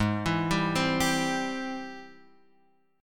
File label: G sharp Augmented Major 7th, Guitar, Open F; arpeggiated; JGuitar